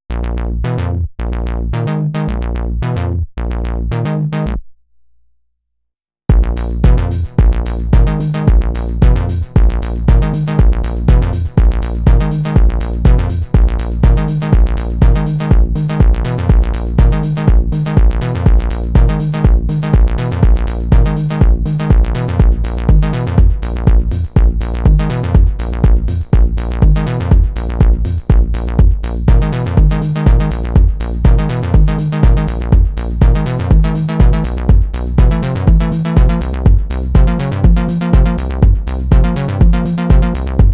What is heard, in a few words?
Digitakt Slices; Wuerfeln mit Slices